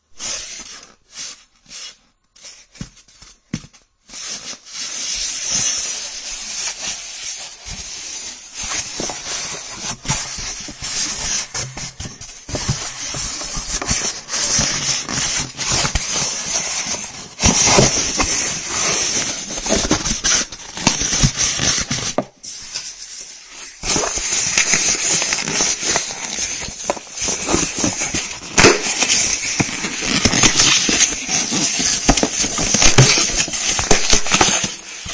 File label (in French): Le chant du polystyrène